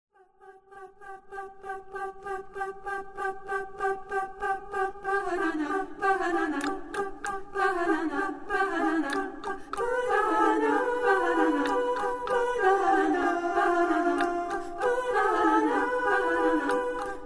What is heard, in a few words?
banana fanfare